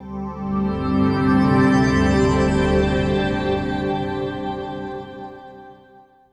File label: Guitar Textures